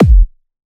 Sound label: thump, kicks; RoBKTA Sample Pack Supreme